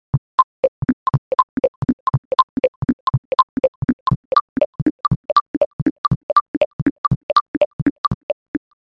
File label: Cheap Rythn Box Mono